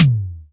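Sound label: SC40 bass drum, Pearl SC-40 Kicks; DRUM MACHINES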